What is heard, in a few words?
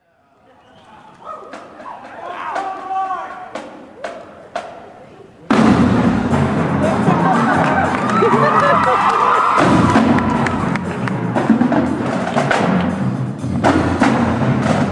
Helichopter, drumline